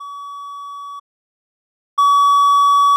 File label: pitch loudness